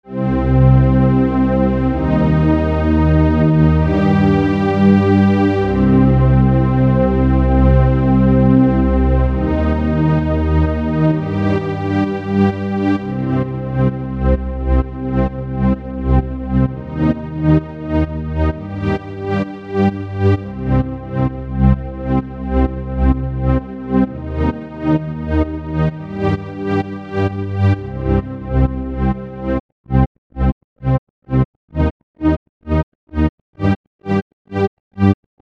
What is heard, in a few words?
Sidechain peak Controller